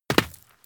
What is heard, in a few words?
grapple Detach; Kerbal Space Program; KAS